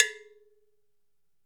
LOW CUP GO, African perc; AKAI S6000 CD-ROM - WorldSounds

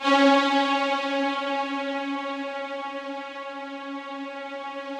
Yamaha VP-1, ROUGH STRNGS, C#3; Music Store

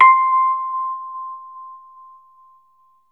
Rhodes octave C, L; 3D Sound; 3D Keys VOL-1

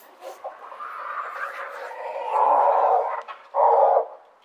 Scary Cock, Birds; outdoor

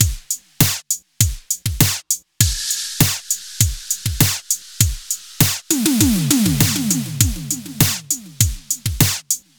100 Beat; Zero-G; Future Wave; Beats

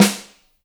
SN 3 MED, Ensoniq ZR-76

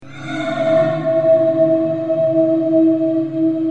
Voices, industrial, FX

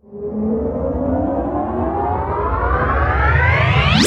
FX Sweep Up; Vengeance - Essential Clubsounds Vol.3; Sweeps Up

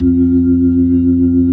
B-3 Jazz, keyboards; Keyboards of The 60's and 70's

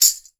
TRASHY, TAMBOURINES; Voice Crystal - Steve Reid Definitive Percussion

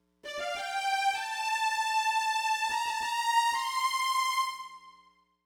Smooth Groove Strings, 70s Analog Funk; Sonic Foundry (Sony Creative Software); What it Is!

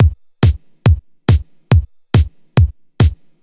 Kick Loop, 140bpm

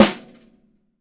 SONOR, SONOR SNARE

